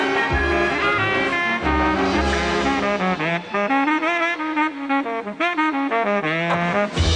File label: solo